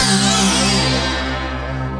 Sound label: Synthesizer Middle C Notes, Lead